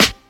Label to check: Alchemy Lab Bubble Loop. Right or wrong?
Wrong